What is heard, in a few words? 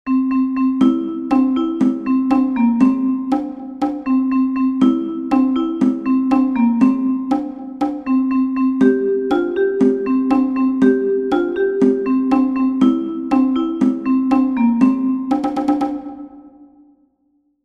Cajon; Ein Lied